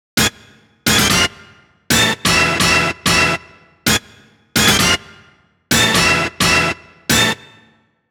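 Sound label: Orchestral Hit Dry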